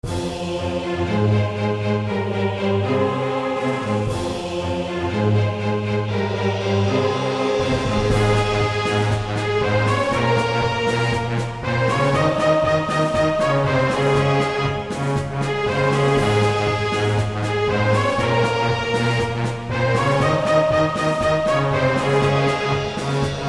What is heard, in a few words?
Orchestral; AVGN